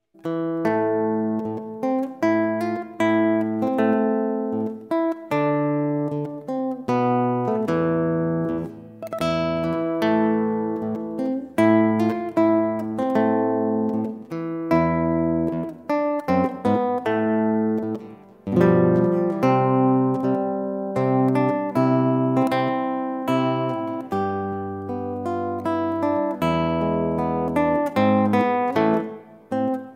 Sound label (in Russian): gitara